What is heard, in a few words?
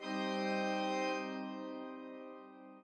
chord sa g c d p g c d p